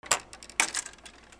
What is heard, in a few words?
OFFICE